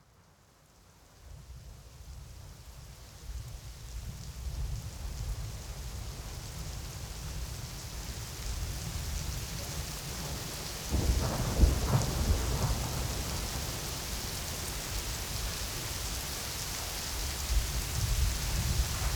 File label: Dark Rainy Night(ambience)